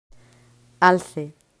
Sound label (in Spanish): alce